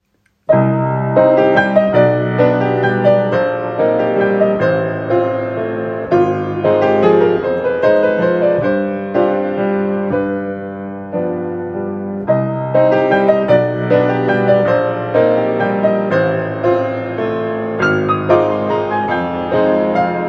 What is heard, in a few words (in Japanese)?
ノクターン